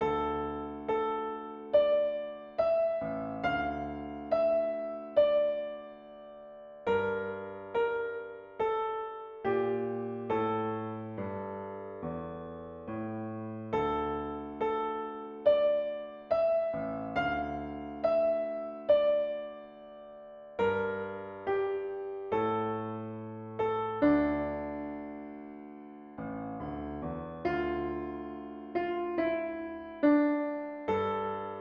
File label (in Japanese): ピアノ